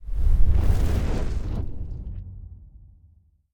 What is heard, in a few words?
transition monolith map